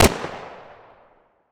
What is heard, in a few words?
glock, weapons; glock18; Counter Strike Model Pack; CS2